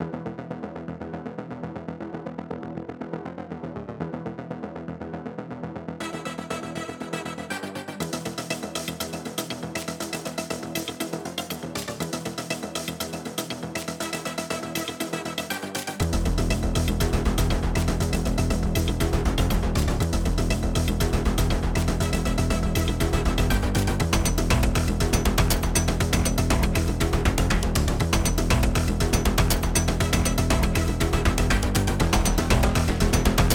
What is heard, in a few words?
Dramatic Background Theme; Astro Flame: Starfighter; PS4, PS5, Switch, Xbox One, Xbox Series X|S